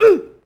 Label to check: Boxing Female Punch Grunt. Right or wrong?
Right